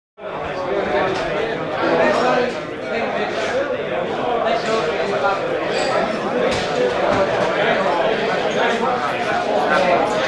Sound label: Bar; Austrian Hotel; Austrian Hotel Bar Atmosphere. Male Chatter With Occasional Cash Register Noises.; bars & discos